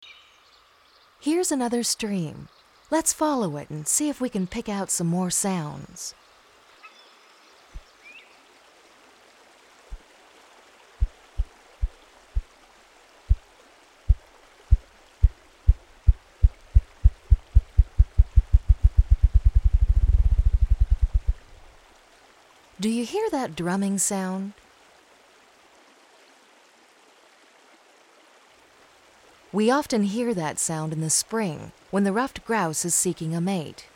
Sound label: Ruffed Grouse